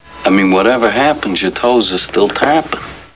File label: tappin